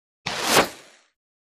Single, Fast By Of Arrow Shot From A Longbow. Right To Left, Semi-rough Texture, Medium Low Pitched Pass At Close Perspective.; Flu-flu Arrow